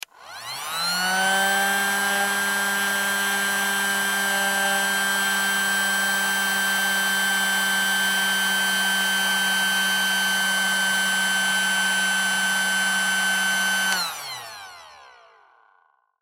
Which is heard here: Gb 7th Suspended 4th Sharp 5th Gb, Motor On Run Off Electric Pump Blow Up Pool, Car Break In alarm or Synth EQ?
Motor On Run Off Electric Pump Blow Up Pool